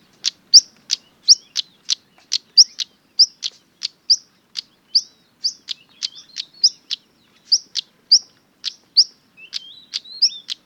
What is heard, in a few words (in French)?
tarier pâtre appel